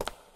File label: cave grounds step; Golden Crayon Sounds